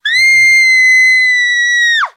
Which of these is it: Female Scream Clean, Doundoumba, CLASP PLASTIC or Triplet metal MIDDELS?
Female Scream Clean